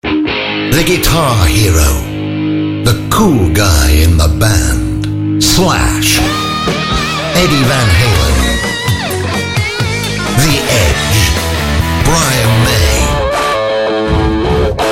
guitar

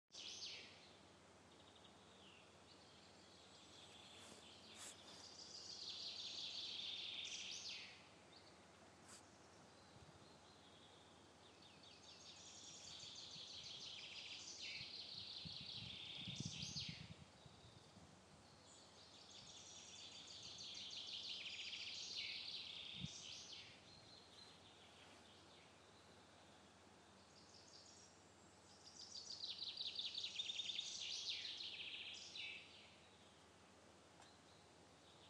forest bird singing

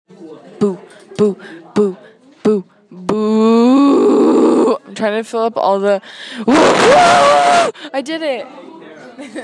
haha

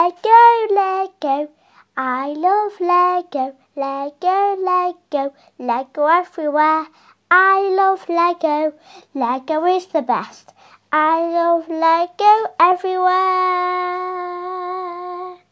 lego song

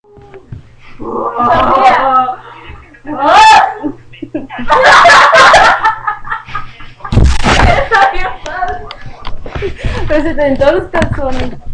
laught

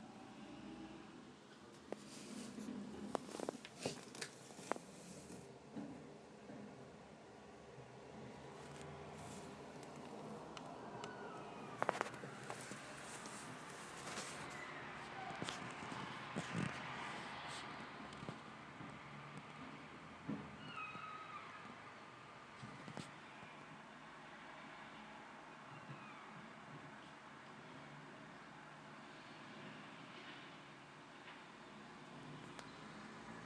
some very strange noises outside

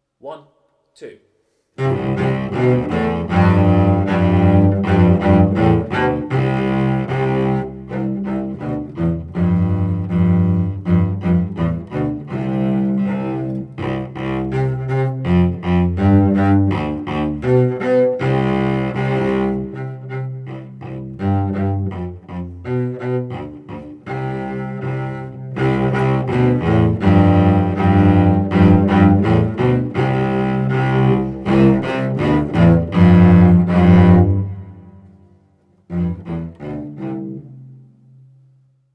chopsticks for two cello

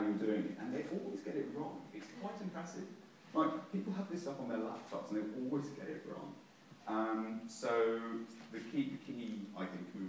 plays the cello; tedxyork

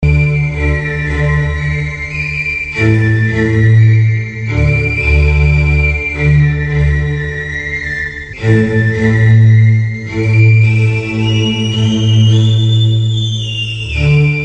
flute

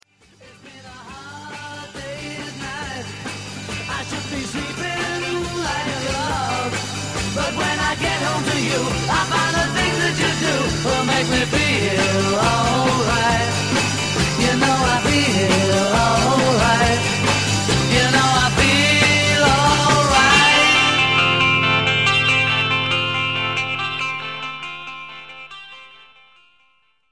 backwards music

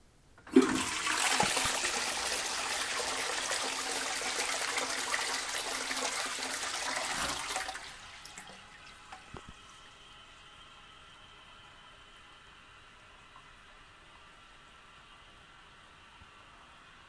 toilet

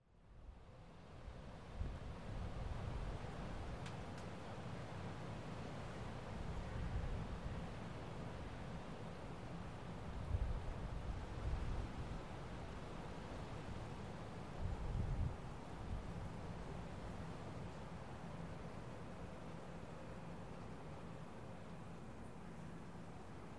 morning ambience

